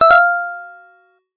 triple letter bonus; RS Games Sounds; Scrabble

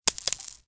coin; Original TK Sounds

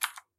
keyboard type; Golden Crayon Sounds

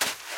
sand step; Golden Crayon Sounds

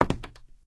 wood step; Golden Crayon Sounds

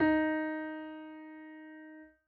piano d#; Golden Crayon Sounds

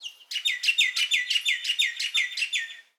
birds; Golden Crayon Sounds

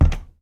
vent land; Golden Crayon Sounds

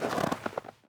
snow step; Golden Crayon Sounds

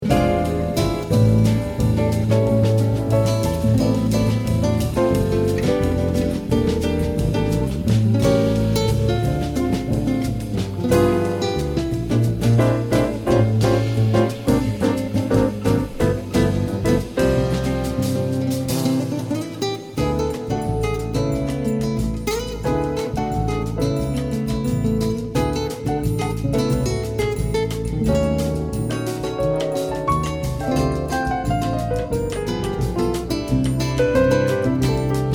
background; RS Games Sounds; Apples to Apples

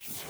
Mouse Drag, macOS VoiceOver Sounds

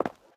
concrete step; Golden Crayon Sounds

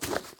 grass step; Golden Crayon Sounds

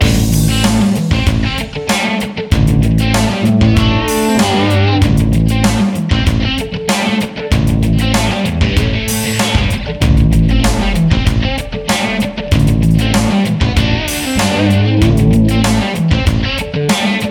encounter guitarist; Manamon Music; Manamon 2

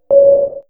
ball; Business skieme Beta 1

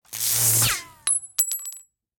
stun dart stop; Original TK Sounds

